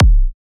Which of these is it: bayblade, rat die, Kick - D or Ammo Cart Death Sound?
Kick - D